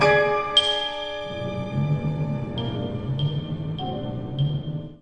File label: lurker in the shadows, orchestral and classical